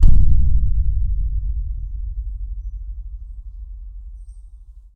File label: Stretched Canvas Bass Drum